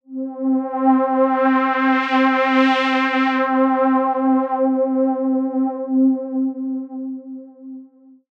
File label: PAD Swell - C; Synth One Shots